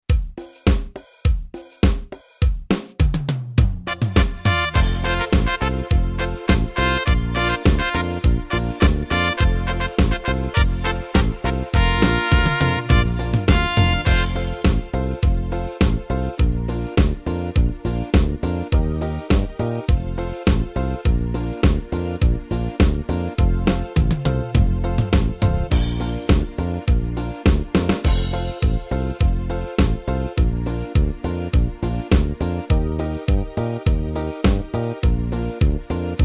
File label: ritmos yamaha; catchaca